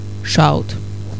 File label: shout